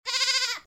goat; grubs; weapons

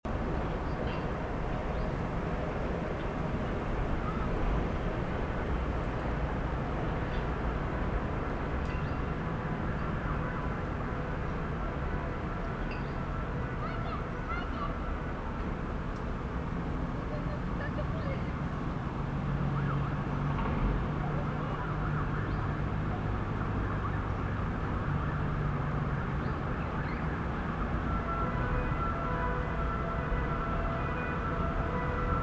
distant traffic ambient loop

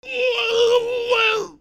scream, zombie screams

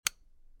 flashlight; dlfortwars

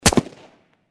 m4a1 shot; outbreaksurvival

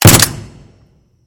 heavy silenced fire, silencer; swb; attachments

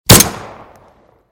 smg silenced fire, silencer; swb; attachments

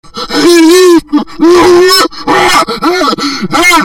scream, zombie screams

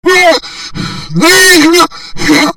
scream, zombie screams

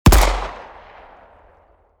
revolver shot; weapons; grubs

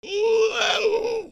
scream, zombie screams

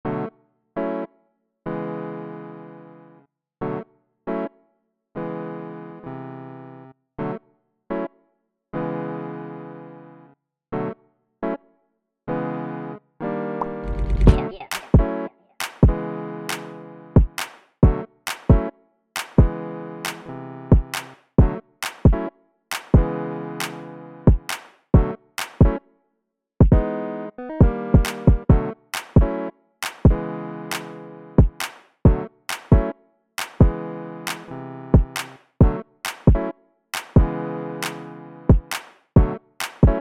menu track; micro mayhem ost

